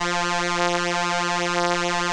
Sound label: ELKA, String Machines; ELKA RHAP